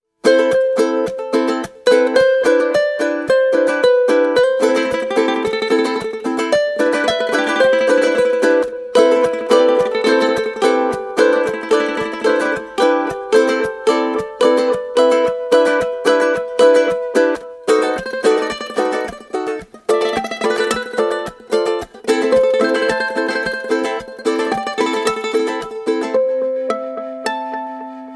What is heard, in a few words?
Guitars; noiiz world guitars; javier barrios